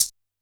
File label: Maracas, Standard Kit, Yamaha PSR-E323